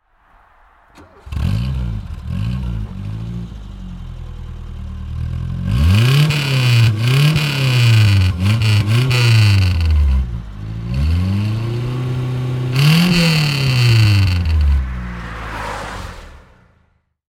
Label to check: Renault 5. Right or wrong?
Right